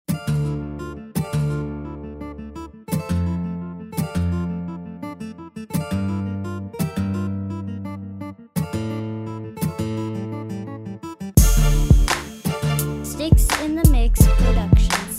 guitar track

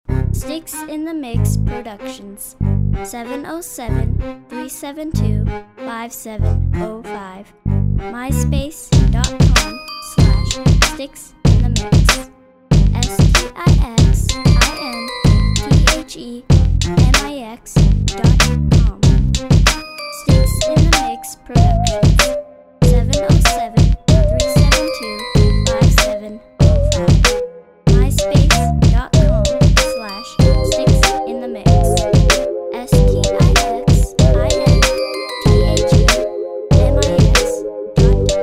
flute classical

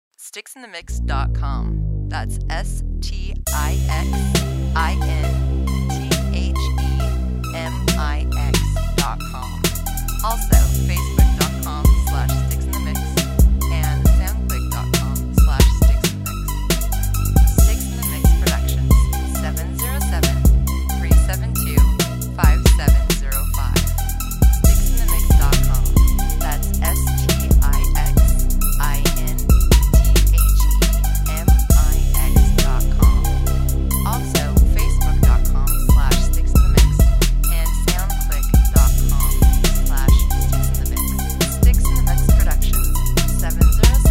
Trance saw bass

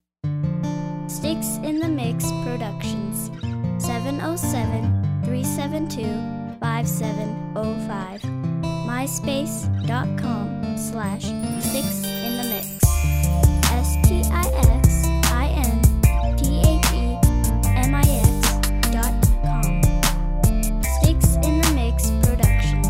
guitar & reggae organ

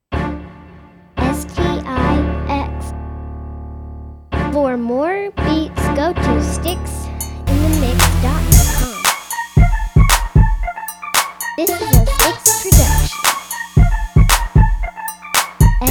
crunk bed

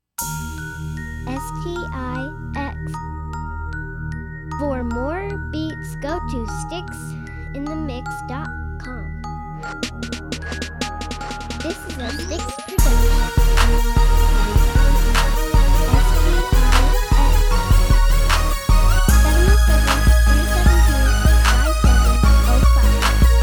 dirty melody

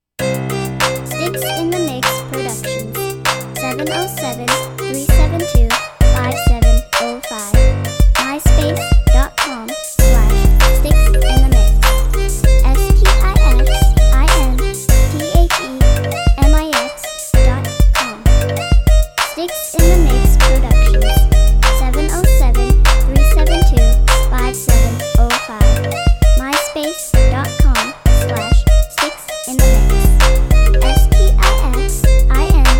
crunc violin

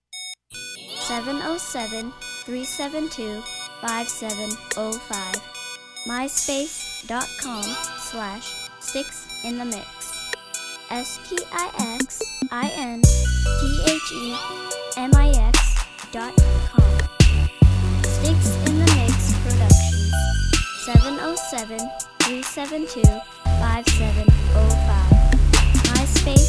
beat; slowmob

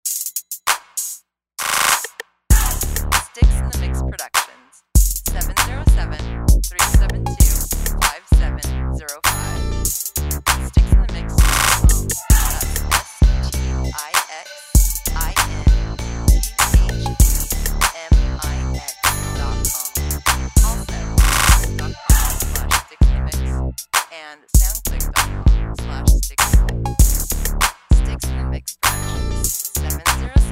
Funk Bandit

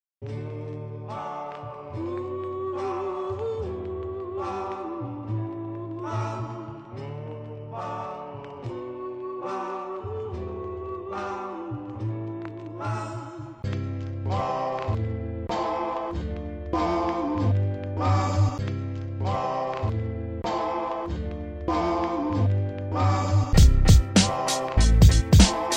Doo Wop

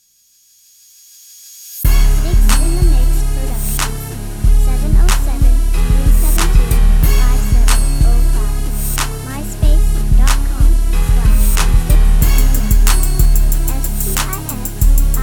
crunk; crunk spider

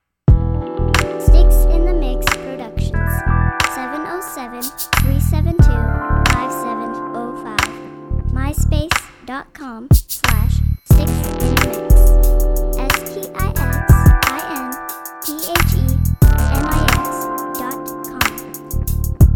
west coast funk

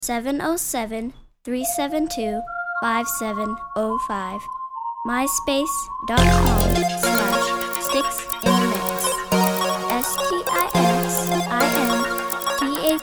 clubbin crunk

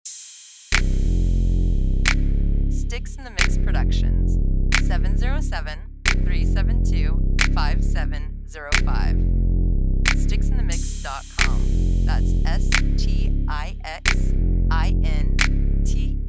Dark Bass

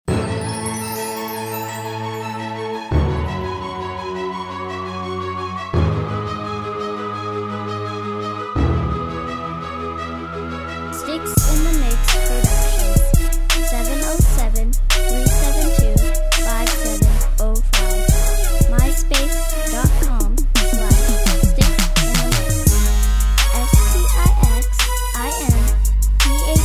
crunk

